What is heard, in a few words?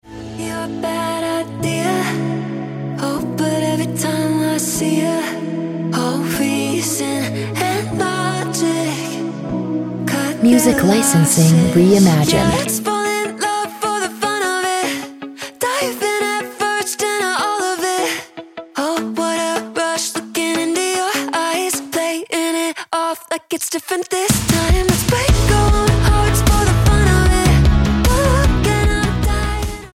Ringtone